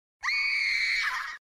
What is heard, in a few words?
Girl Scream